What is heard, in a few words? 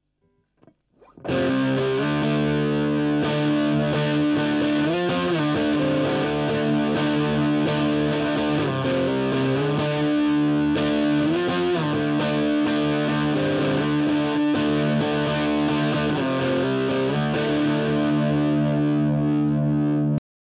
Standard HP gain middle